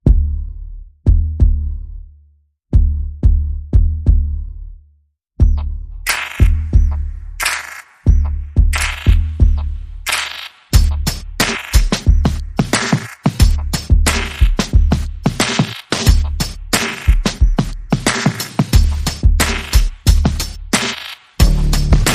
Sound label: Bass and Perc